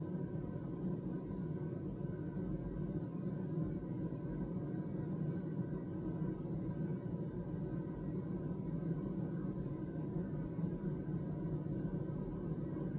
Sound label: spin